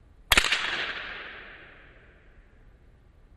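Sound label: Hockey Medium Impact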